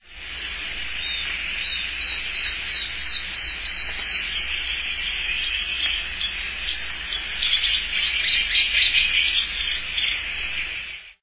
capitao-do-mato-pequeno

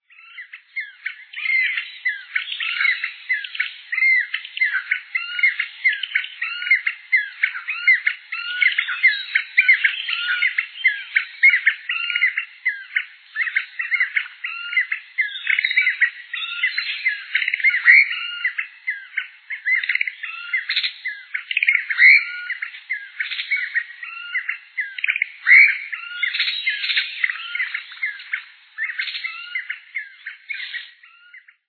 bico-de-brasa-de-testa-branca

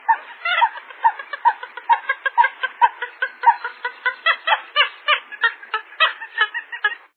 frango dagua azul

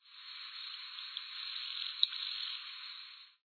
cigarrinha-do-campo

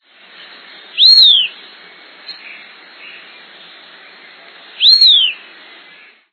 viuvinha

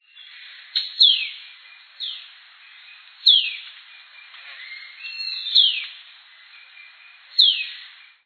pipira-de-asa-branca

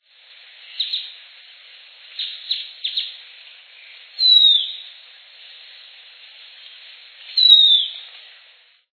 papa-formigas-de-topete

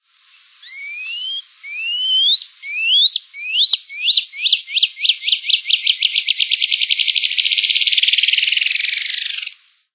ipecua

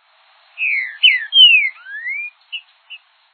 trinca-ferro-verdadeiro

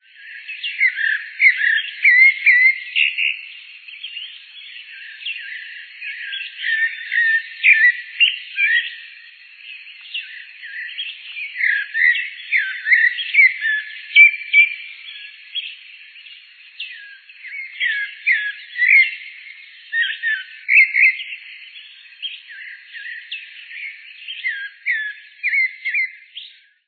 sabia-da-mata

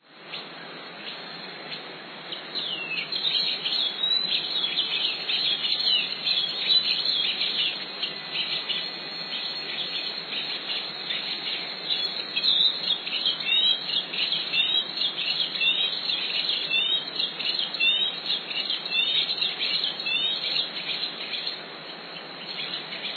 tietinga

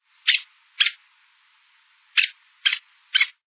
aracari-banana

